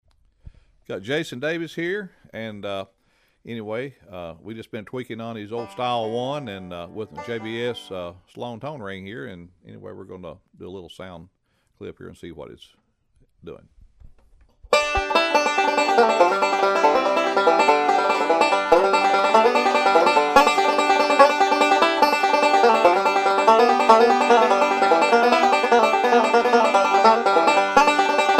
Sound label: ToneRing, banjo; Banjo Tone Rings; Jason Davis